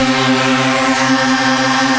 Synthesizer Middle C Notes, Lead

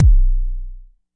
Jomox MBase, drum machine; Jomox MBase 01

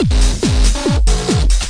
140 bpm, drum loops and breaks; 131 to 140 bpm; samples-ghost

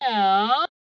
formant filter vox hit e, Synthesized Voice Hits

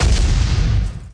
bomb blast; Weird and Interesting Hits; single hits

